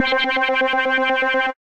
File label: Echo, synth rhythmic; Instruments; Wavetable; Stubby Echo; Ableton; Factory Packs; Synth Essentials